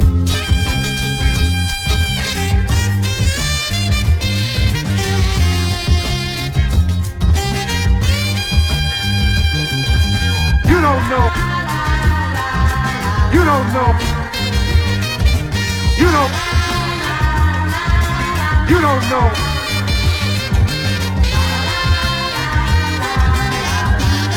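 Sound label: Summer instrumental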